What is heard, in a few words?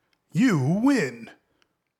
win